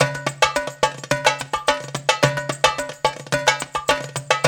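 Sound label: 108 bpm, darabuka bell; Spectrasonics - Supreme Beats - World Dance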